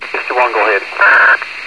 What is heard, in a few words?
ge-star, scanner